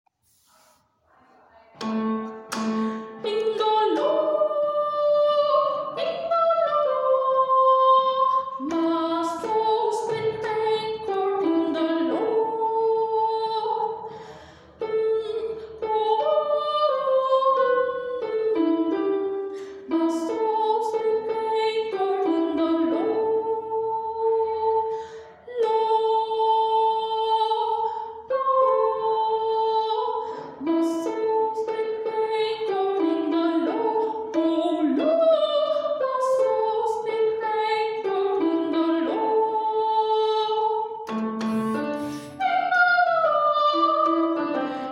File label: soprano